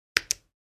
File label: Casio calculator single button press